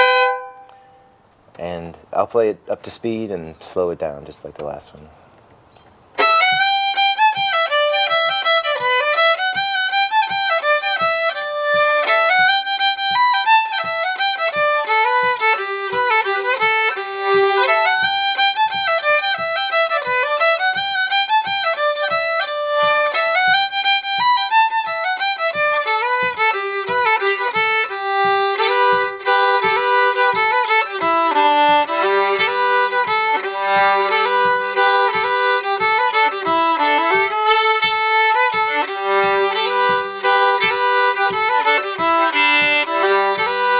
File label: slow, fiddlers; jaybird died of the whooping cough